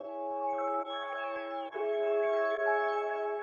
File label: PHRASE 140BPM C; Elden; raevn creative soundscapes